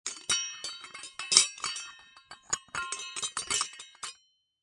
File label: Cartoon Bell Jingle, Random metallic clanking; Comedy/Cartoon; Funny Sounds